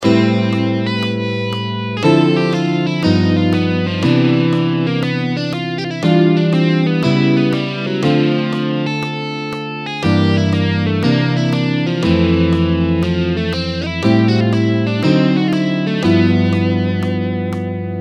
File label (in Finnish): Kuukauden soolo